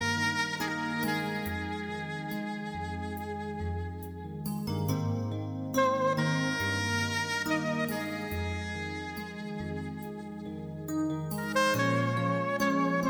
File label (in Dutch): saxofoon